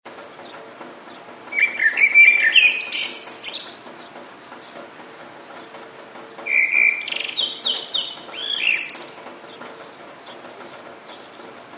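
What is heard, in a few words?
sounds of birds singing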